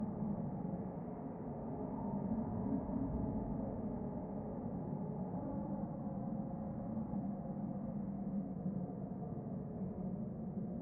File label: Wind Loop